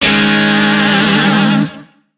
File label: Shawzin Yo Chord B; Music; DE; Warframe; Lizzie Shawzin